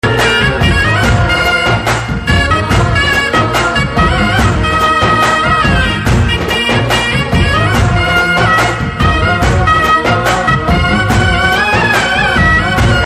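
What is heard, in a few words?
background music Telugu Ringtone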